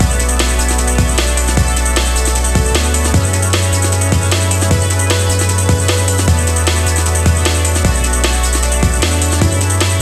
dnb schnell

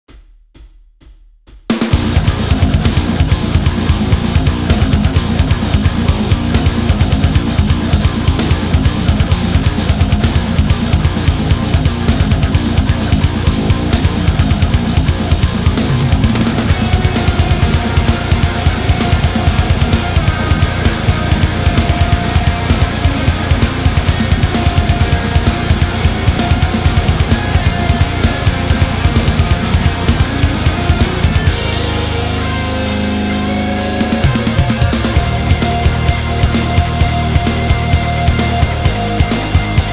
Dorian Fun

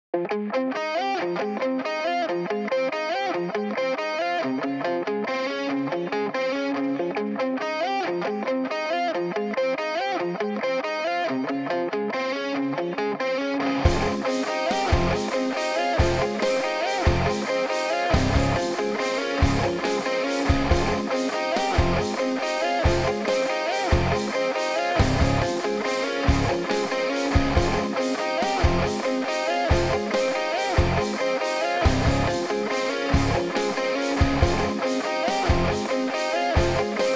arpeggiotto

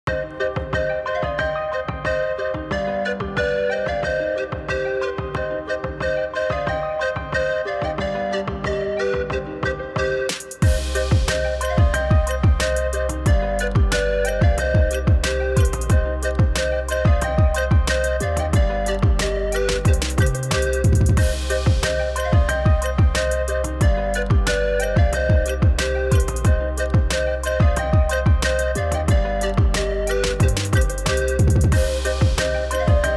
Roller Coaster Trap

